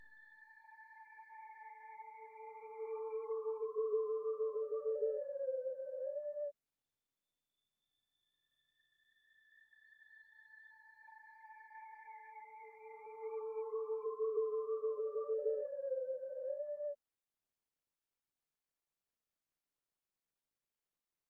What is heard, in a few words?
92 BPM D Min Lead Texture; Melody; Loop Stems; Cymatics; 2022 Melody Collection Platinum Expansion - Pt 2; Top Floor